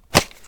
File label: pickup; raycaster